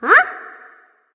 nv confused; cupid

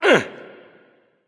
grunt lift; smite; hades

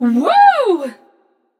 yay passion; chaac

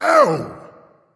ouch, voice; smite; voicepack; ymir

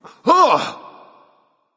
surprised; smite; ares

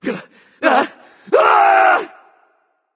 fear; smite; hou yi

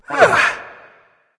nv sigh; smite; voicepack; mercury

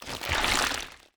deployable idle; smite; god ganesha skin11

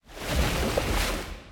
lobby water; smite; cthulhu

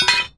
defeat metal drop; smite; vulcan

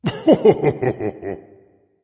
heh heh, voice; smite; voicepack; ymir

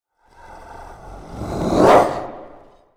Victory Monster Vox Attack; Smite; Susano

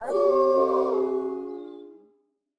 howl; smite; cerberus

